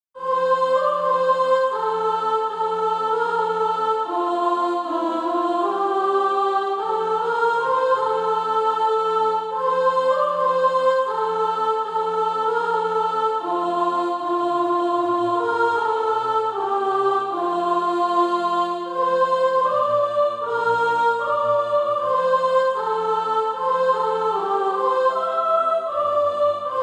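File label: soprano, Practise Music; SATB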